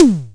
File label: SNARE, Simmons SDS5